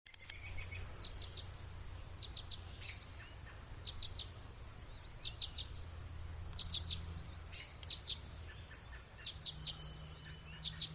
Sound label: red crossbill